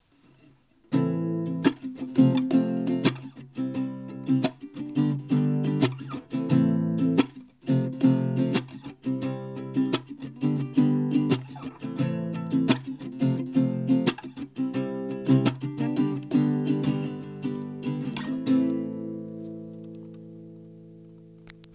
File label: kids nylon string guitar